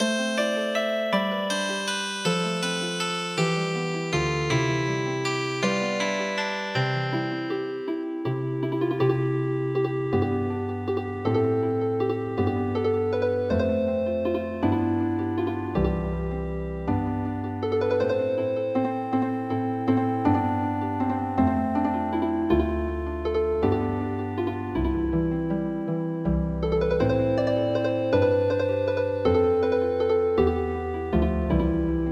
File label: Healing Harp, traditional; The Castle of Dromore